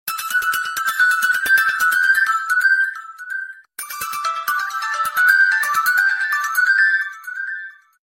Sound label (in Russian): standartnoe sms na samsung galaxy win